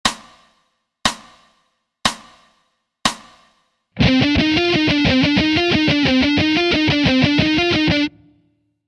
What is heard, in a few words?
Alt Picking; Pattern B